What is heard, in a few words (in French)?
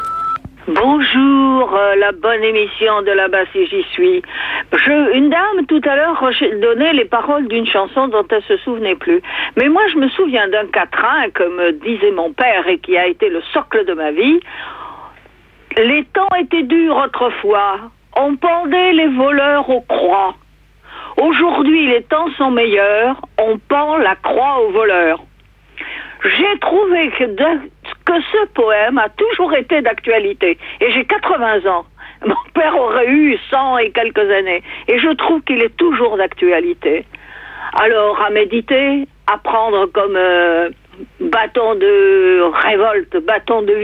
Poeme chante par mon pere; repondeur